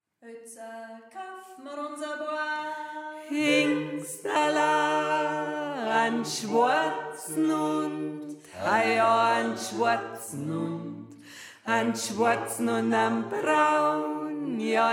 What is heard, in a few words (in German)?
Tenor; Iatza Kaaf Ma Uns A Paar Hengstala